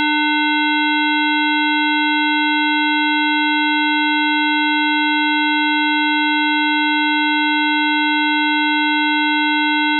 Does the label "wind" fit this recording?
No